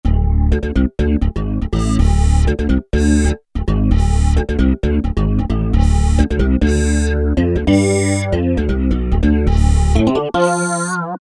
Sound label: Sync Bass, Roland SR-JV80-01 Pop